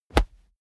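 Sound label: combat cloth bassy hit, close combat; krieg-waffen; nahkampf; soundpool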